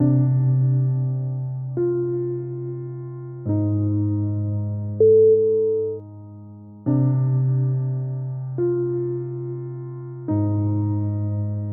apocalyptic sadness

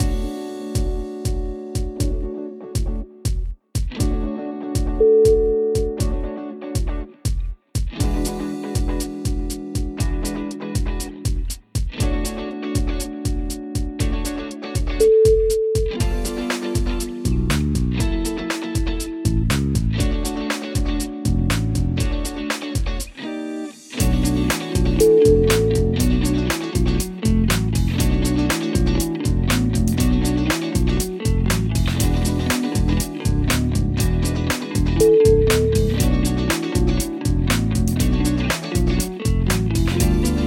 fashion groove

